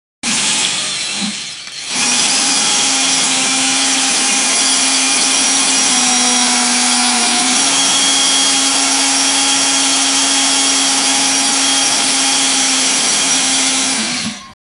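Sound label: satisfying drilling sound, construction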